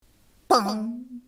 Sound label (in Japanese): 小鼓